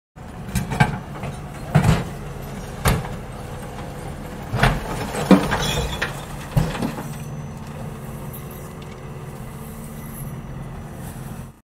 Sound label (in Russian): musorovoz